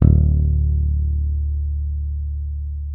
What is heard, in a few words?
D1, FINGER MUTE; Xsample VOL-1